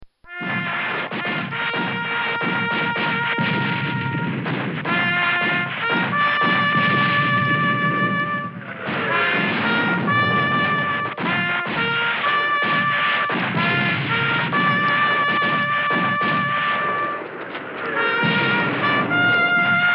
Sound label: Taps; This sound effect was recorded in a custom built sound booth